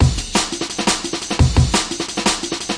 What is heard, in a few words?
173 break liq, DnB Breaks; 141 to 185 bpm; Ethex